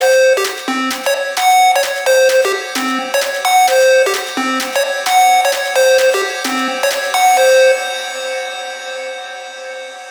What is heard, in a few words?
polyphonic; rington